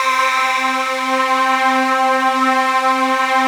PAD, 170 BPM; Ueberschall; Drum & Bass Resonance

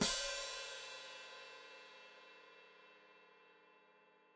Hat Closed Open, Hi-Hat

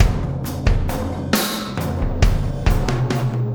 Impact Crater Beat, Processed Drumkits

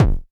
BD, Bassdrums, Roland TD-20

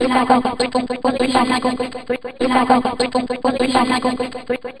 Mouth Music, Vocal

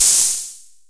Bass Open Hat, Jomox Airbase99

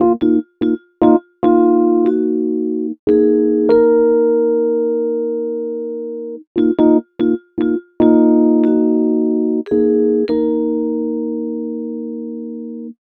Rhodes Vibes Combo; Sonic Foundry (Sony Creative Software); Cinematic Funk Urban Tone Poems; Session 05 - The Triad

